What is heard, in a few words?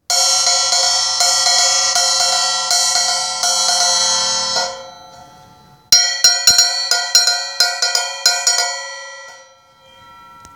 Camber 4000 bottom